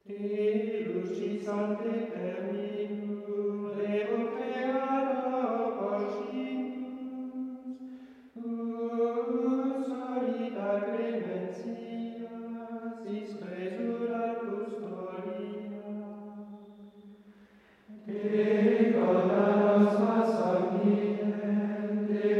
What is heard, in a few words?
Vespers Compline Hymn